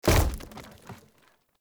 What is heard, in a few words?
Barrel Wood Hollow Medium Destroy; Objects